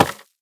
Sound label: cardboard pizza box impact; physics